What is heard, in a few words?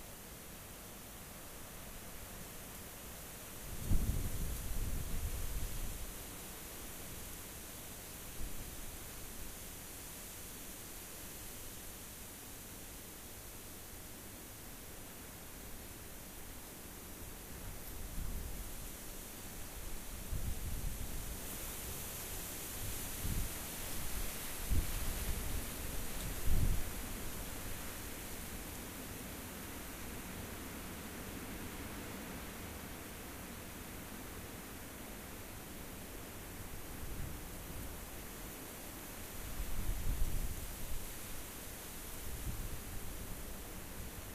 rayol farm; SEAMED